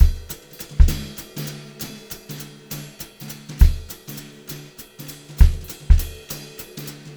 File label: 200 JZ VAR2, Brushed Drum Loops; USB Soundscan vol.39; AKAI; 90 s Sample CDs